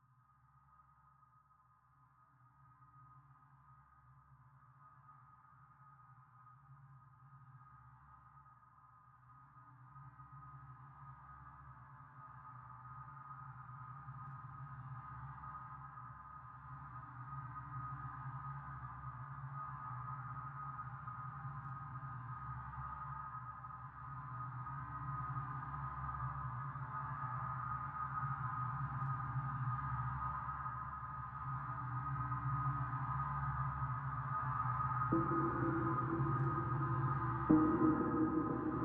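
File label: Noise Orch Subtle Whine With Piano Detuned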